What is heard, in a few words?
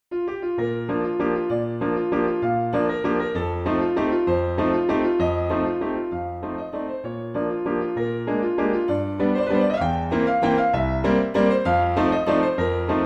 Waltz in Bb Grade 6 piano